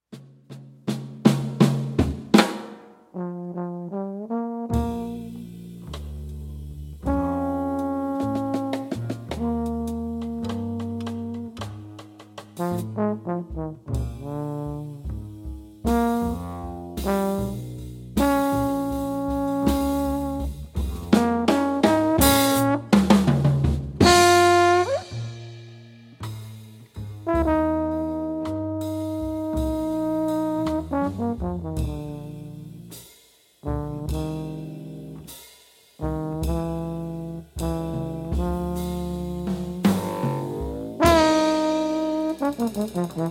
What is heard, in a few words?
blues